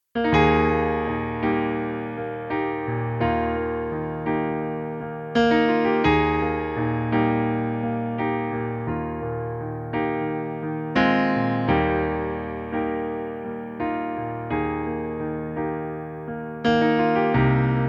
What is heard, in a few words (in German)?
Pianoplayback Eb-Dur